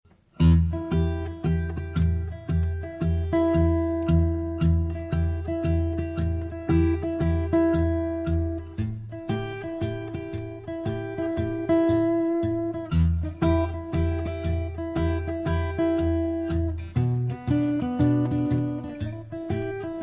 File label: Em Blues